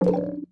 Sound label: notice common negative